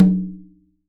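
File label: Percussion Conga; Polyend; Simulacrum Sounds; 1 Shots; Acoustic and Electric; Drums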